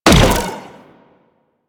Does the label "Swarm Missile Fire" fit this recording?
Yes